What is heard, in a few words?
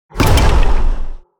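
pounce stun; creatures organic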